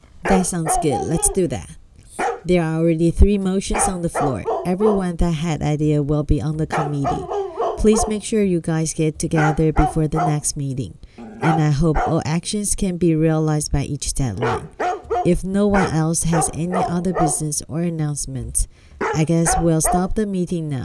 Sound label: dog Feature OFF